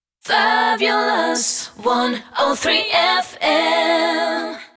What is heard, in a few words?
Jingle Acapella